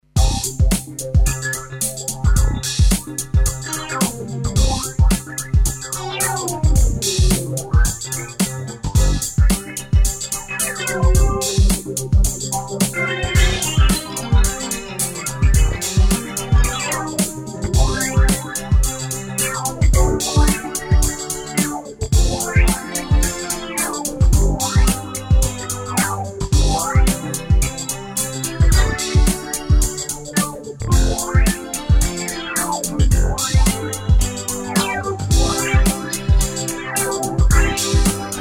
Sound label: piano